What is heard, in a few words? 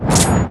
spirit wind attack